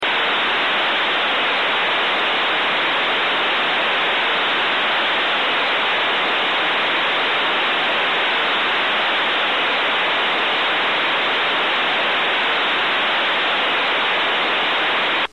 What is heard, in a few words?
Hissing